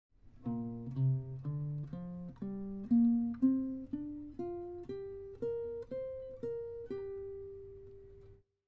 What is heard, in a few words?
G minor pentatonic scale, guitar; This is an example of a G minor pentatonic scale using shape 2